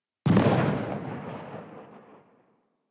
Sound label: Fire Tail, Weapons; Strun